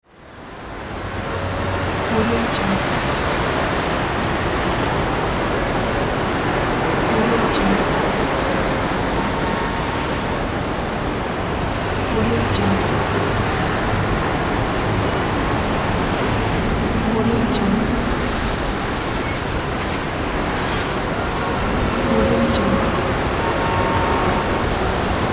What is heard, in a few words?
city sounds